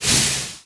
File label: fire out; Tony Hawk's Project 8; XBOX